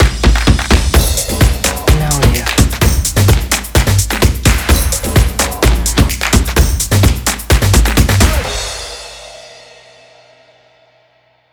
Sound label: Energetic Percussion logo